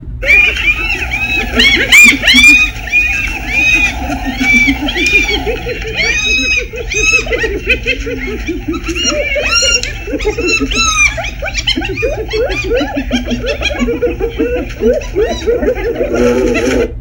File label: Hyenas laughing